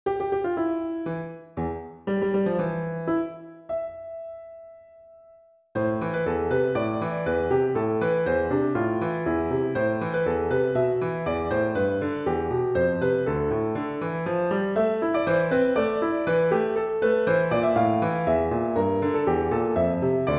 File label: Polka for Piano